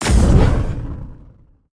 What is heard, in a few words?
projectile; smite; poseidon